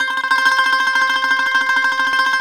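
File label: baglama tremolo C 4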